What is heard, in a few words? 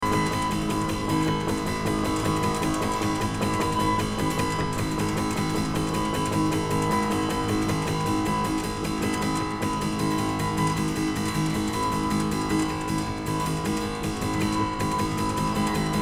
for harpsichord long